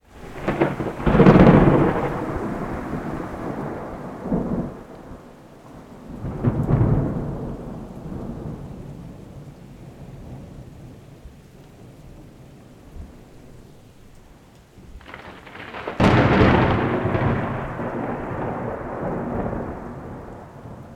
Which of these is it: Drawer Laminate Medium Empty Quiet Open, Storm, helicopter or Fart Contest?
Storm